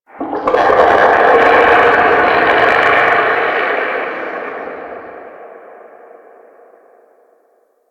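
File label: Shipyards Distant Eerie One Off, Ambience; Warframe; Grineer Shipyards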